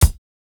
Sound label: kick, house